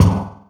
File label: Spitter Kick, Esoterik Beatz; Spitter Kit